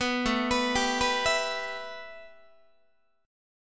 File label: A 7th Suspended 2nd Guitar; A7sus2 arpeggiated